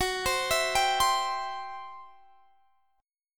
C Major, F sharp, chord

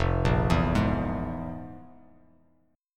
F Minor Major 7th Double Flat 5th, Bass Standard; FmM7bb5 arpeggiated; JGuitar

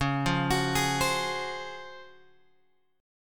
C sharp Major 11th, chord; C#M11 Chord {9 8 X 11 9 8} arpeggiated

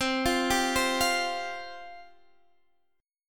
C Suspended 4th C; Csus4; arpeggiated; x,15,15,12,13,13; chord shape

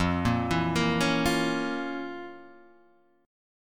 F Major 7th Suspended 4th Sharp 5th F, Standard; FM7sus4#5 arpeggiated; JGuitar

